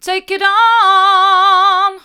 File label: take in on hard, vocal